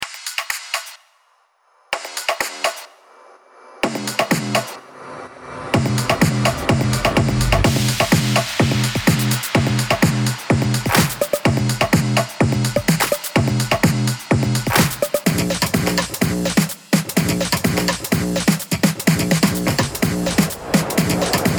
Deep House Progressive Kick Free Drums 126bpm; Singomakers

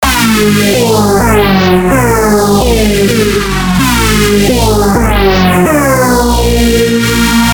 G 128 Bassline

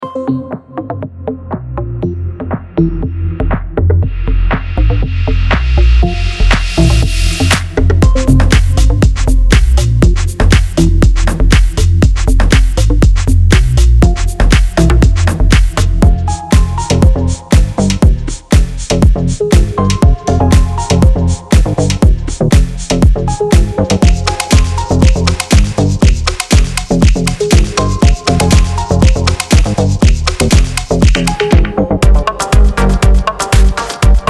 Deep House Progressive, Deep House 120bpm; Singomakers

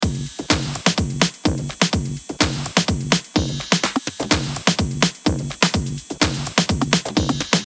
Drum Loop 126 Bpm

